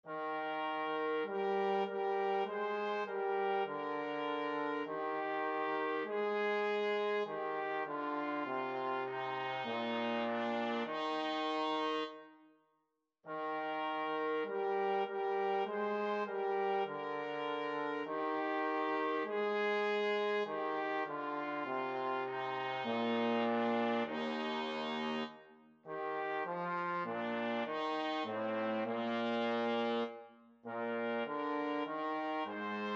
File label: lo how a rose, trumpet trombone duet